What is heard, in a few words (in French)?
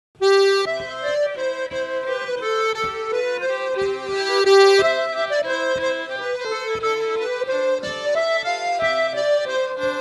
VALSES Valses Ecossaises